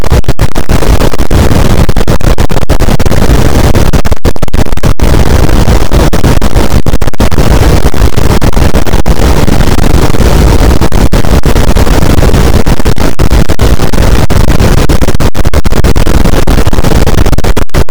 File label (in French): cachalot negatif, boat, Physeter Macrocephalus